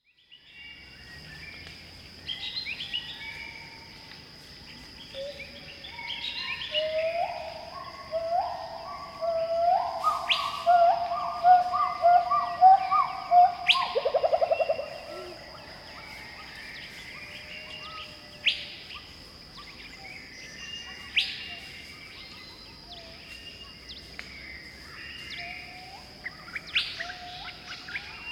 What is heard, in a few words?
Gibbon Pileated, Khao Yai Pileated Gibbons